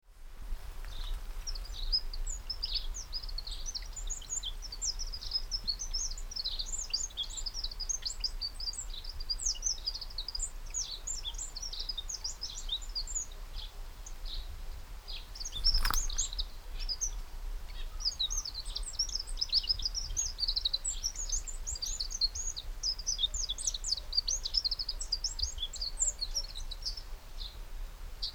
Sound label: Troglodytes troglodytes; SETE